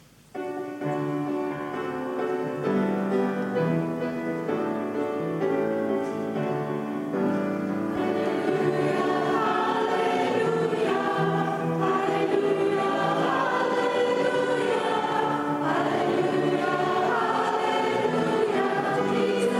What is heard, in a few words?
Hallelujah